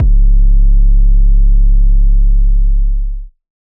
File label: 808 - C#, Hip Hop; Drums - One Shots; 808s